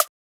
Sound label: snare808